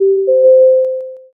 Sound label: m notify